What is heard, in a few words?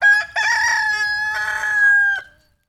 animals rooster